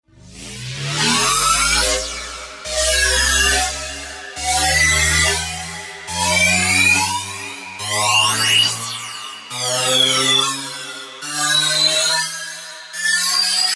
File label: Tonal Risers (140 BPM) 8bar C; Revealed Tonal Risers Vol. 2